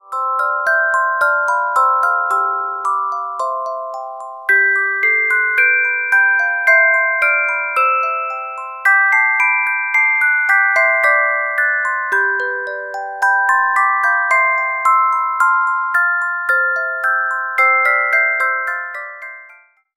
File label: Carillon Music Box Melodies